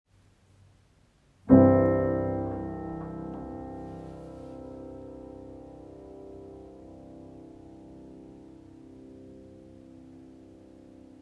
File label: C Major Chord with pedal